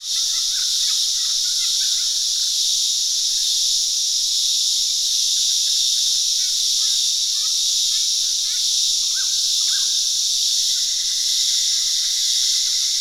nature ambience rainforest australia day summer insects birds